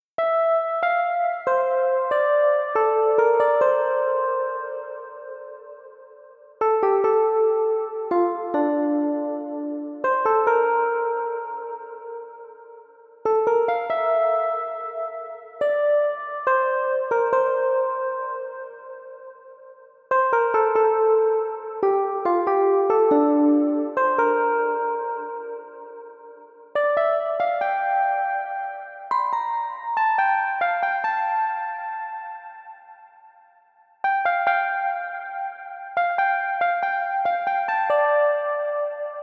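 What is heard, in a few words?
love piano; comp; by burak sound bank; dramatic dram vol 19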